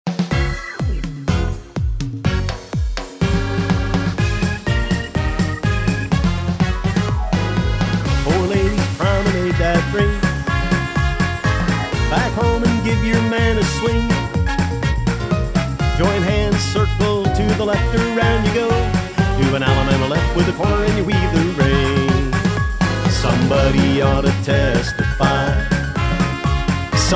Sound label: vocal